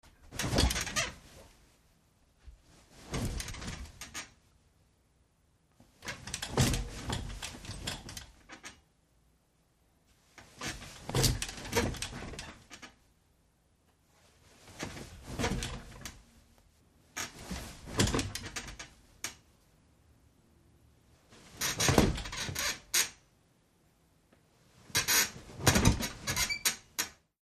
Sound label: Body Getting Into Sitting Down On Bed W Light Spring Squeaks.; bed movement